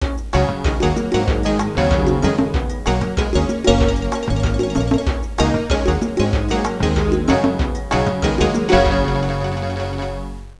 suonerie contemporanea; musica contemporary

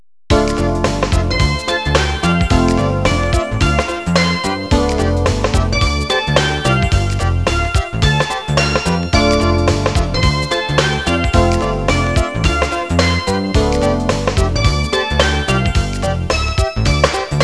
suonerie businessy

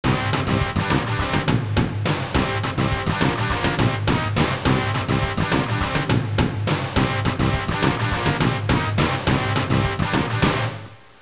suonerie musica rock

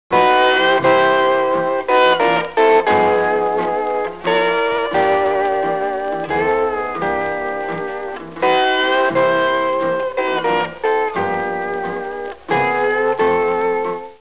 suonerie musica hawaiian

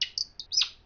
uccelli birds, animali

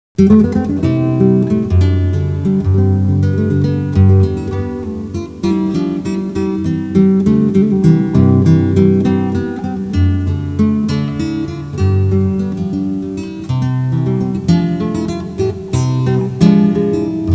musica strumentale

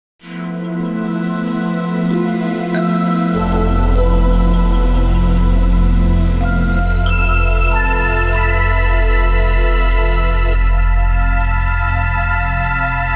musica newage

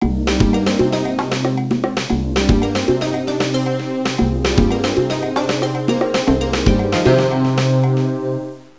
suonerie contemporanea; musica contemporary

